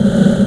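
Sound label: sub loop, engine; ships; Quantum Redshift; XBOX; tara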